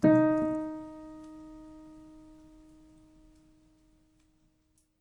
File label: piano sample